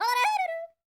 YODEL, World Voices; ILIO; Vocal Planet

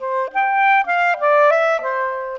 Clarinet; Sonic Foundry (Sony Creative Software); Orchestral VOL-2

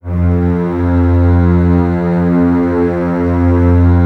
Basses F 2, String Ensembles; Miroslav Vitous; LINKS ORCH

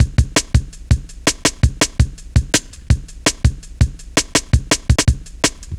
Tin, Jungle, Drum Loops 165.5; Zero-G Jungle Warfare 1, 2 & 3

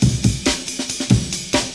amen

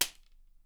WHIP; Miroslav Vitous - Percussion & Harp

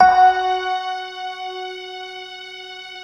Fender Violin, Fender Stack; Optical Media International; Sonic Images Library

